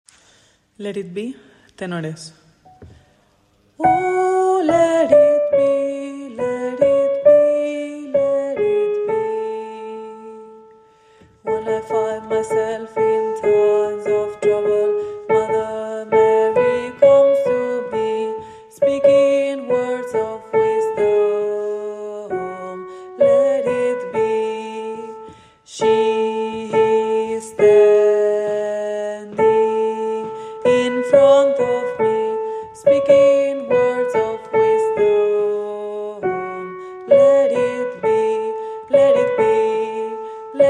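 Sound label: tenor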